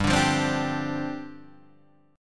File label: G 7th Suspended 4th G down Guitar Half Step Down